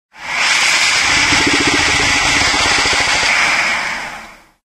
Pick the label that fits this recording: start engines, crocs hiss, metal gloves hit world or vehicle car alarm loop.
crocs hiss